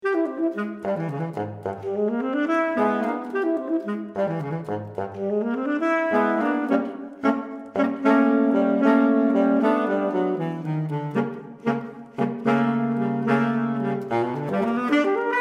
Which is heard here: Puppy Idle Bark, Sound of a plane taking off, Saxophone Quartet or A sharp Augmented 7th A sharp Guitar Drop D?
Saxophone Quartet